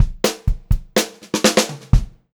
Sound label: 126 PO5, Pop-Rock Drumloops